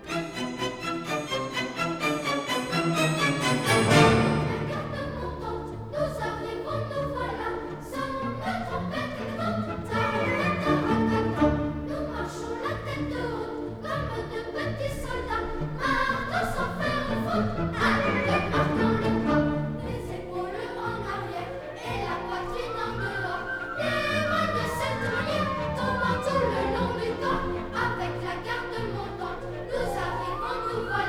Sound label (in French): La garde montante voix et instruments